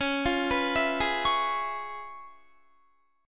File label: Db 7th Sharp 9th Db, down, Guitar; arpeggiated; 4E,4A,5D,5G,5C,6F; JGuitar